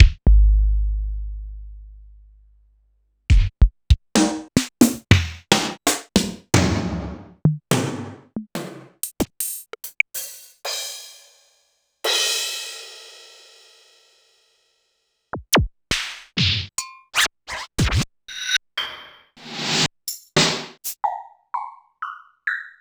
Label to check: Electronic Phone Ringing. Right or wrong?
Wrong